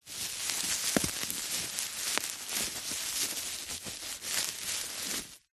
plastic packaging film bubble wrap handling movements; office